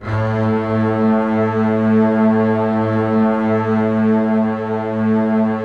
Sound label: LUSH, Lush Strings, Lush Mello; Optical Media International; Sonic Images Library